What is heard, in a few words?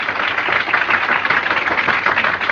medium applause